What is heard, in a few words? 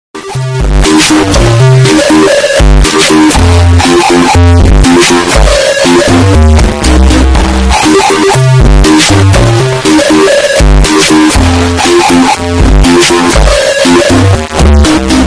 Music Ringtone; Kahoot